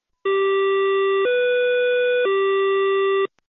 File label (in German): tuersignal, S-Bahn; Fahrzeugpark; Aktuelle Zuege